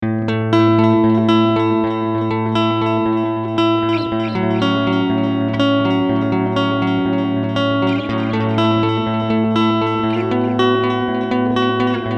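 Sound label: Guitar Digital; ReTracer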